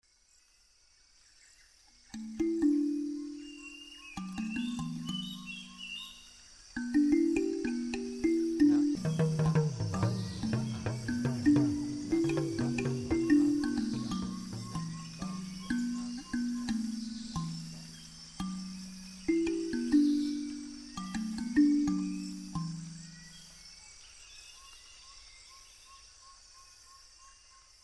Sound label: kalimba